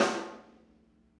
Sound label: SNARE med, Drum Kits; Big Fish Audio - DOM; Redrum